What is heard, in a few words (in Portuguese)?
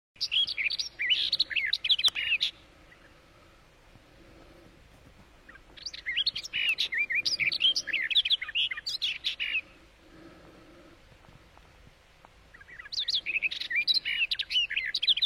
Canto da toutinegra gaviao